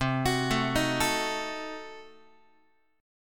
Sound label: C Diminished 7th down Guitar; arpeggiated; JGuitar